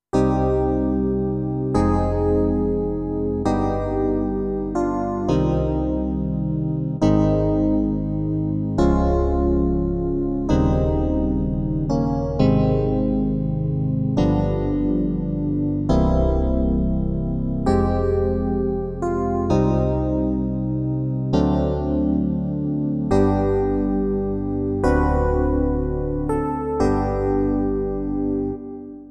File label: DX Legend